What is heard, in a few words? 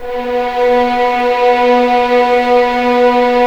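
legato violin, The Ultimate String Collection; East West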